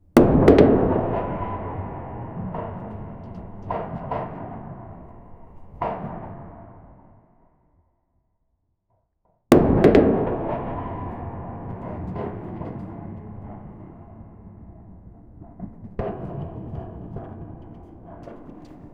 create sound effect of ca, watercraft

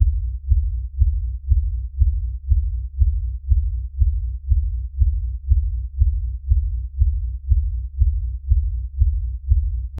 a deep slow pulsating thr, dystopian

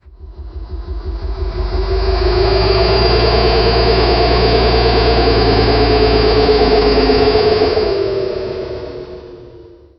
a startup sound of a, diesel

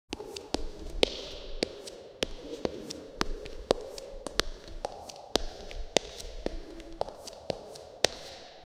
slow walking in high heel, cave

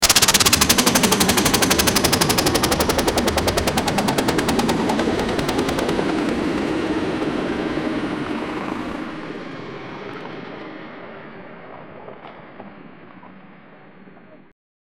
helicopter crashing; aviation

